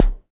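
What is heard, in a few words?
kick, 5U Modular Drums; Polyend; Simulacrum Sounds; 1 Shots; Synths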